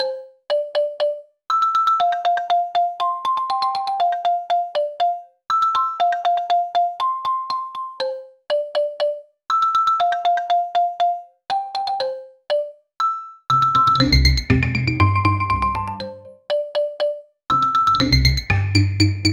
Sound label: game background, children's